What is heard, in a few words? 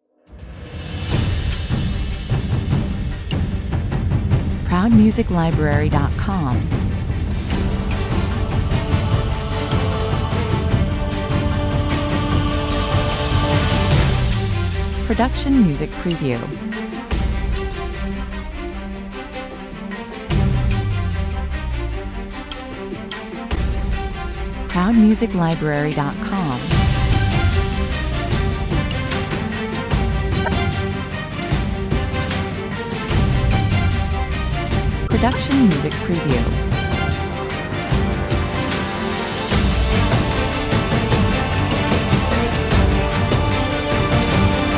droid wars (no stutter fx) 150 bpm